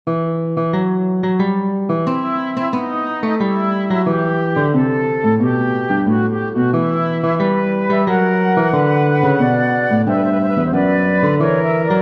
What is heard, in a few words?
flutes guitar; bach johann sebastian; aria feiern wir das hohe fest